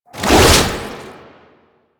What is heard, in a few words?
Proto Infested Babau Underground Attack Tentacle Swing, Enemies